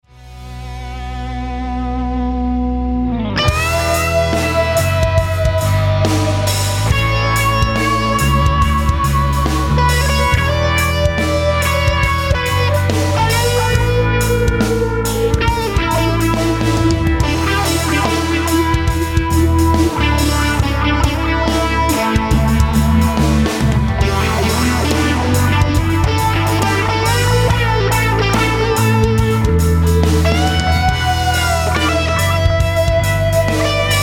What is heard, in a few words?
guitar solo bridge